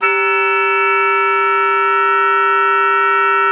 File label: OBOE3; Xsample VOL-6